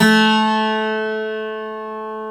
GTR 12, Ac 12+ E.12

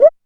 DM5 Perc, Alesis DM5 drum machine

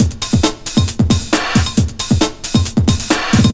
hectic funk, Deep House 135bpm

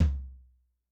TOM ntrl F, Yamaha DTXpress-II

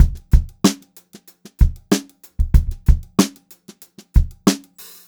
Funk-Groove Drumloops; USB Soundscan vol.38; AKAI

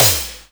Cym FX, ANALOG; Roland MV8800; ANALOG CRAZE

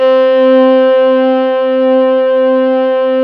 CELLOS C4-R, CELLOS

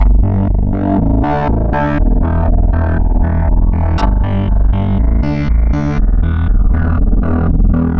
Bass Line; Eclectic Electronica

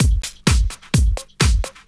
Clubhouse Loop 128 BPM

